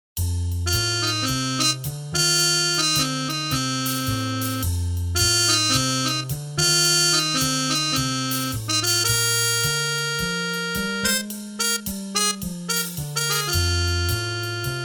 Muted Trumpet; Soundzabound Volume 1 Disc 3

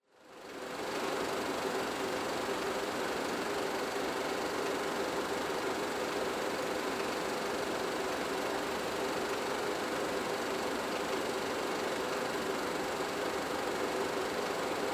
movie projector

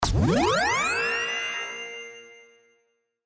Ascend Digital

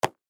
baseball glove catch

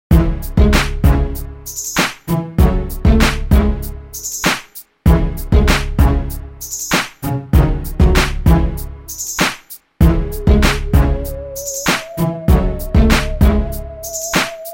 HipHop

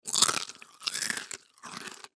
Chew Crunch

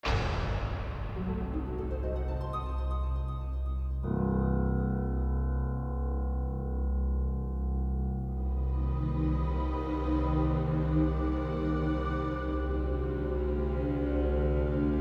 Eerie Musical

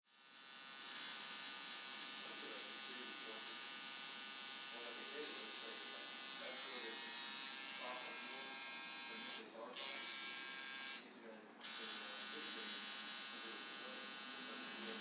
tattoo parlor

